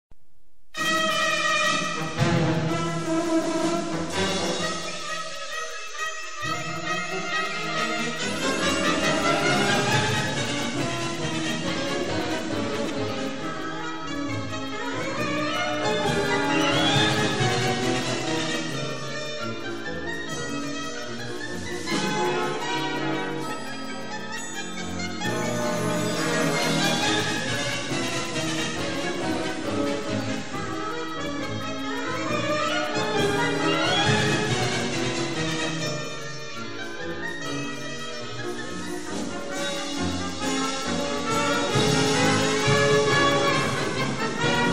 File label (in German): Galoppiade; Lausbuben